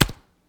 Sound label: Step Flesh